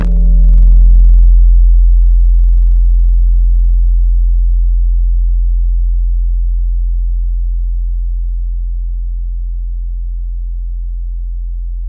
Knock, Tropical Mallets; Synthetic's Serum Collection; SparkPackers

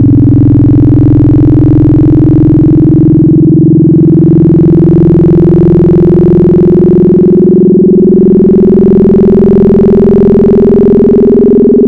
folding sines; Wavetables; Synthetic's Serum Collection; Cymatics - Jawz for Xfer Serum

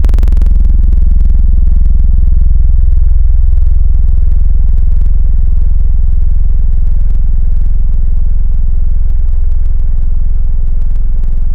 Mellow But Instable, Tables; Synthetic's Serum Collection

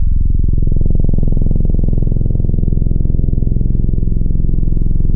Digital Synth Brass; Synthetic's Serum Collection; Echo Sound Works Core Tables

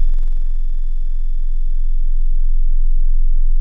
Simple Sineplex; Synthetic's Serum Collection; HYPERBITS Core Tables

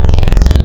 Solid Phase; Synthetic's Serum Collection

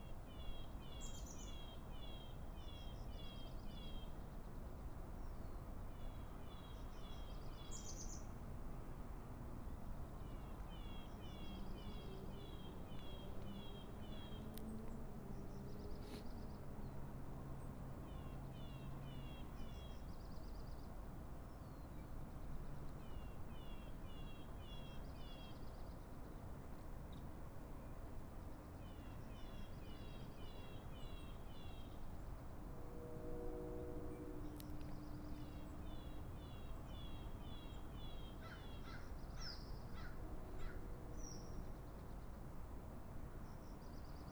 Birds At Sunrise; lolicore

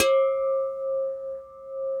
Metal Bowl; ESW Sphere

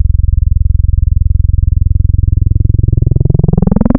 303 Acid; Synthetic's Serum Collection; Cymatics - 303 Acid for Serum Vol 2 Wavetables

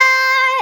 Noises Vocal Shot

